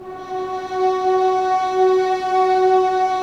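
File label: strings, Vlns Bow FX Sord.; Roland L-CD702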